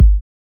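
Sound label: Bd, bass drum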